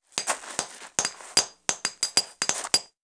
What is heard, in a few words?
miner; Imperialism